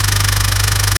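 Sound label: mercedes v12, vehicle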